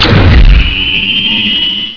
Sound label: plasma, weapons; ParoxysmII